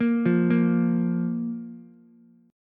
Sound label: Bb 5th, Ukulele; Bb5 arpeggiated